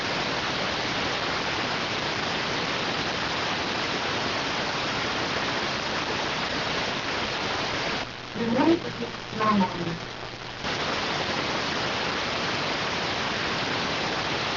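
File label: radioteletype receive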